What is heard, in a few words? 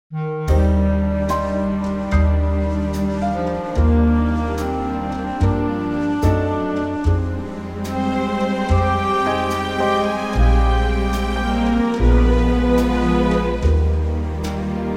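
orchestral Eb, Backing Tracks for Male Vocals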